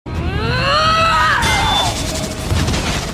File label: grunt; wonder woman